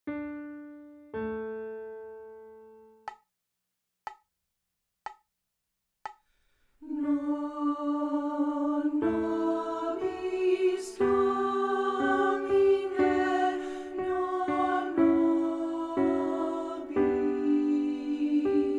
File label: Soprano; Non Nobis Domine; Byrd; Audition